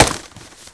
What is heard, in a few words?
Football Helmet Hit